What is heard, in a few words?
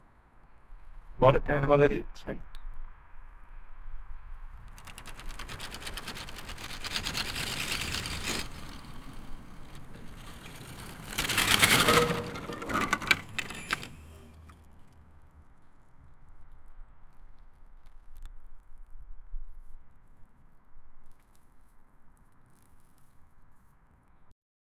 the sound of pulling a, log